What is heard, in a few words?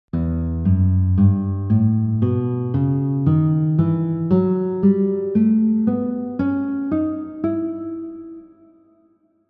E Melodic minor scale, guitar